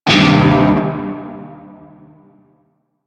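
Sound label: Shawzin Pentatonic Minor Chord B; Music; Warframe; Narmer Shawzin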